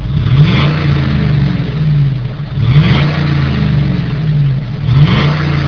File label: chevy suburban 5.3l single; Chevy/GMC